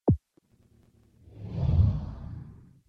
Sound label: location loader complete; Cars